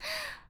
Girl's Sigh

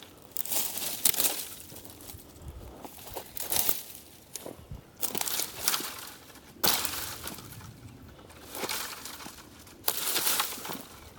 Shaking Wiggling Tree Bush Branches

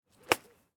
Golf Ball Hit

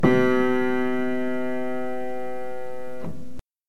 Piano

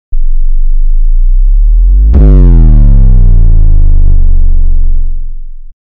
Bass pitch

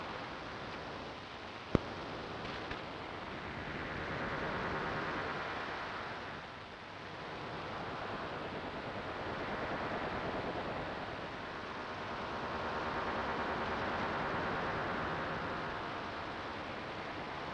Radio Static Short Wave Noise

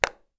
Water Plop Loud

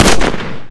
Desert Eagle

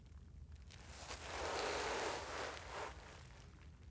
Dragging Of Telescope On Crunchy Grass

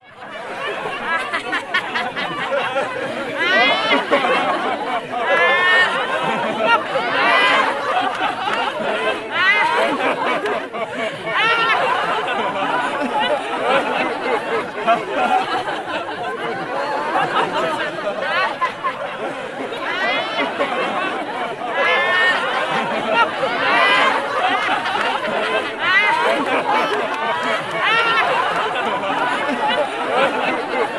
Crowd Laughter

